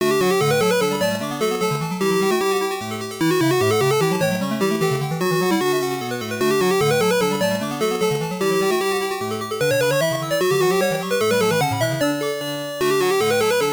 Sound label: Bonus Stage BGM; Spatter; System 1; arcade